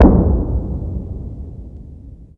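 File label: explosion distant; ModernWarfare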